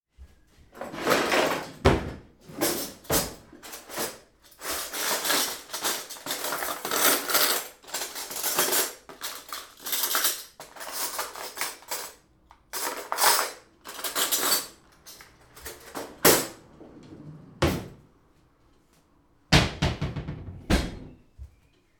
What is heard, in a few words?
After Dish Washing